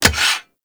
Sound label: Hammer Equip; Warframe; Foley